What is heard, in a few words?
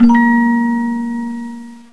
note re